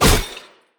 Monster; Zenless Zone Zero

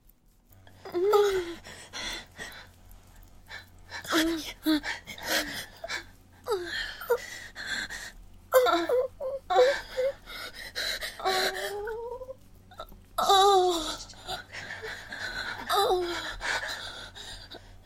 wet sounds whimpers moans orgasms; [F4M] Gone In 60 Seconds; [request fill]; [ear fuckery]; [orgasms galore]; [loop me]

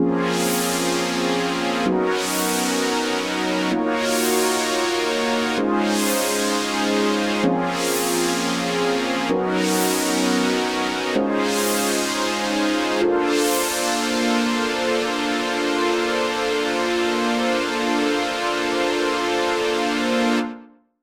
pads; Pony Fan Music Remix Stems

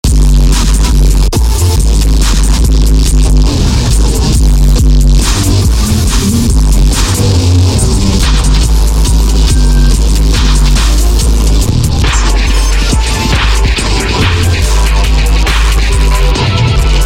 noise; remix

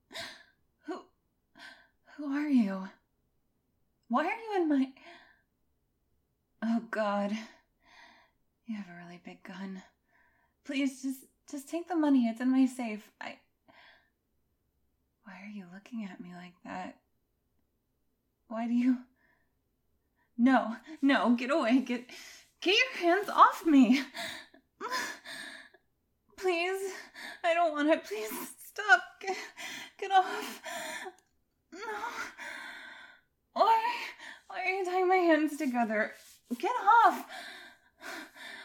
Crying; F4M; Noncon Handholding; Shitpost

